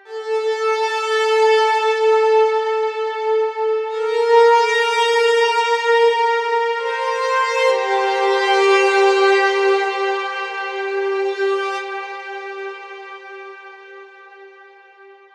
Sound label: Blade Walker, dance electro